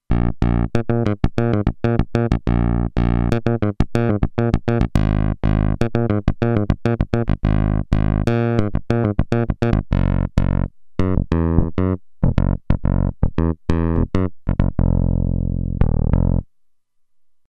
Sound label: Sq Funk Bass, Roland Alpha Juno-2; Factory 2